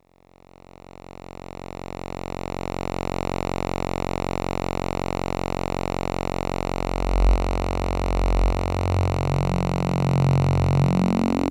Sonic Six Filter Mod, synthesizer

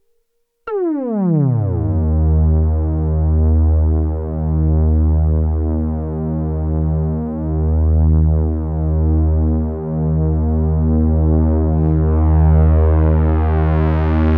A8 6 VCOs two 24dB filter

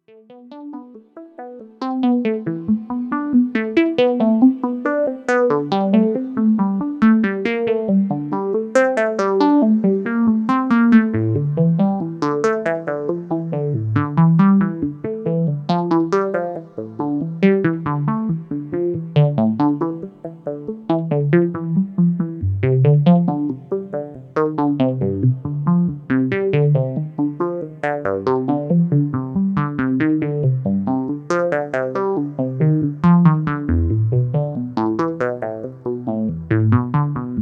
ps3100 two sequencer lines

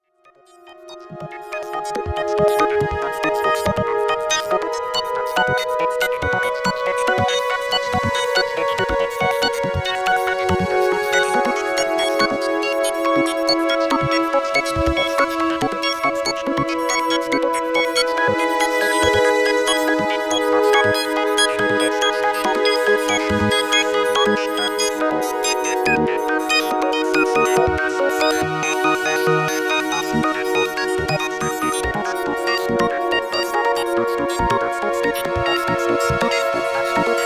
synthex crazy mix

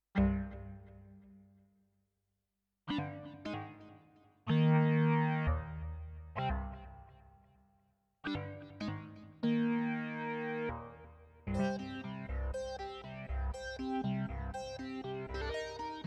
Arpeggio 2 Timbres bip

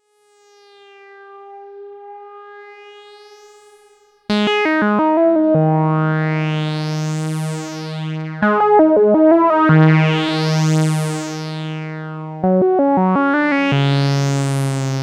ms20 live

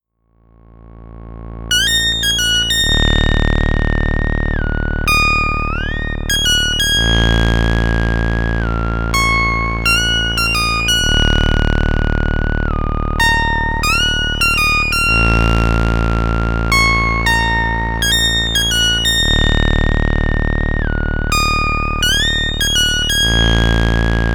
S100F Solo

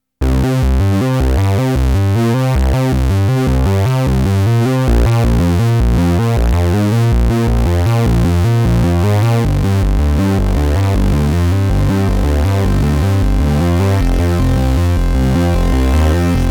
Novation Bass Station II Ring Mod

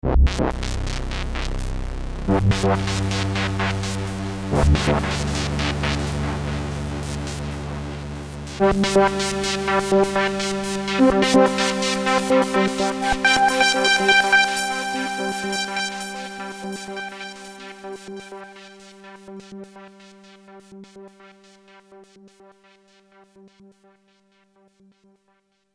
FX Pad LFO, synthesizer; Sunsyn

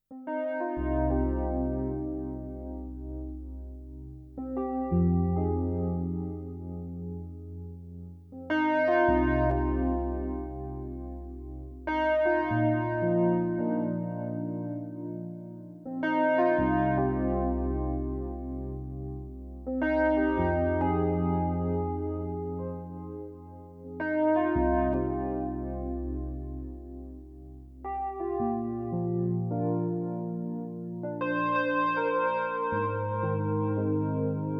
Roland System8

